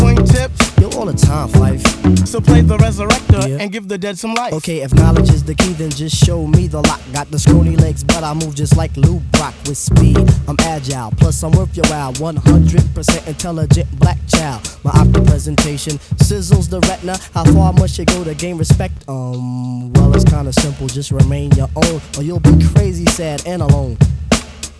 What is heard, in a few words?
hiphop